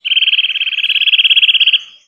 boomklever

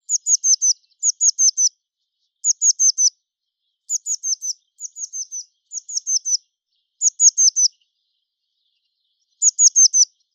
Pimpelmees Binnenveld Roep Alarm

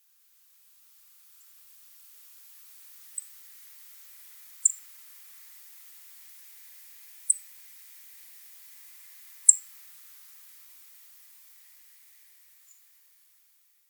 Zanglijster Turdus philomelos

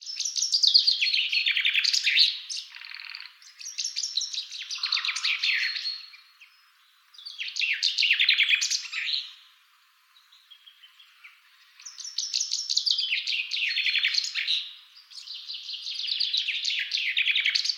Vink Kroondomein

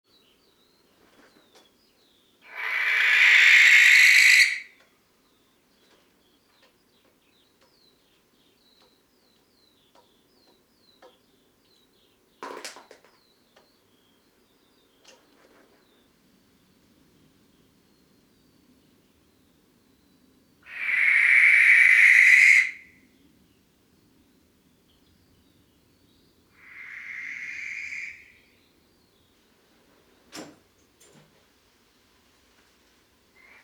Kerkuil Tyto alba

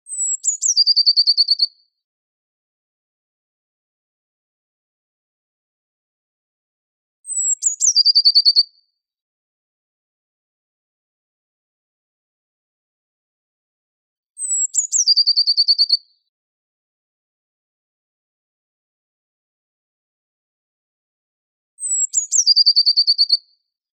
Pimpelmees zang, Nederland

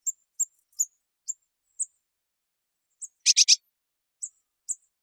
pimpelmees roep contact

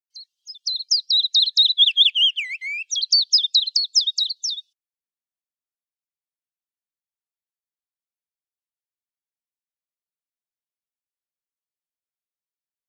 Fitis mixed song; Oeken

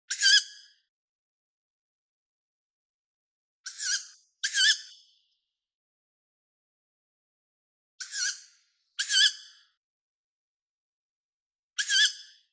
Bosuil; Wageningen-Torkpark

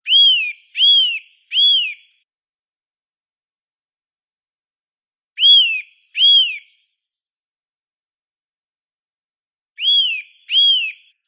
Boomklever; Kroondomein

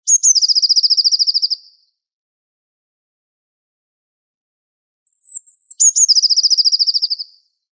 pimpelmees

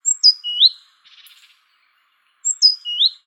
koolmees

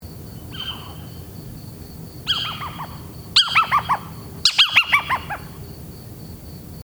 Steenuil Athene noctua

